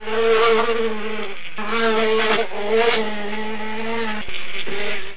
FLY BUZZ

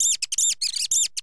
RAT

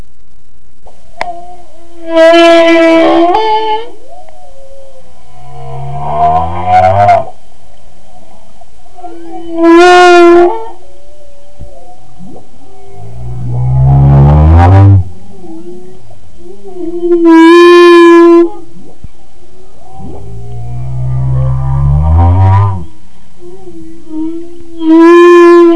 male humpbacked

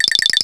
BONES

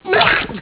puke

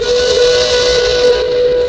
WAILER

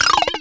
BONES

